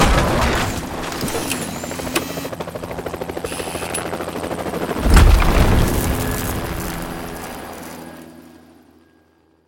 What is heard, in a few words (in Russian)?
bistroletyashij poiskovij vertolet